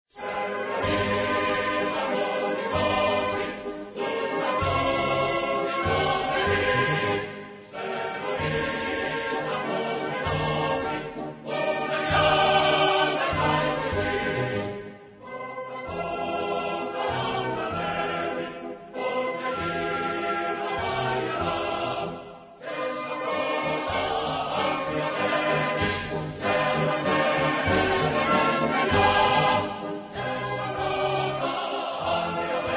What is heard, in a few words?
cyprus anthem